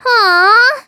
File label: awww